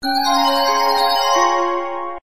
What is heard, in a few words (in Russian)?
Мелодия первого автоинформатора Киевского вокзала; РЖД; короткие, на уведомление, русские, уведомление, на смс, ржд